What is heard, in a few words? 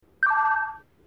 chat new invite